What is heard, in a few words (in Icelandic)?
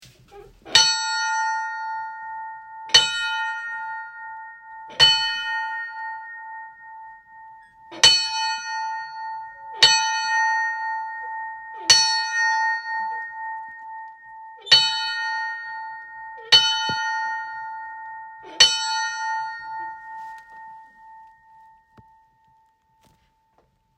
hofskirkja oraefum stora, kirkjuklukkur; sudurprofastsdaemi